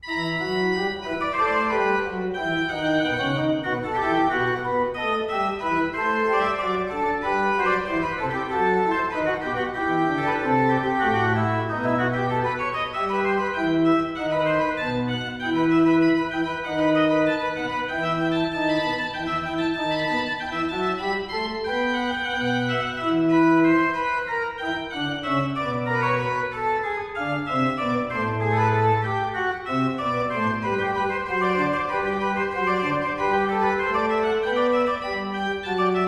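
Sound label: cornet piece, organ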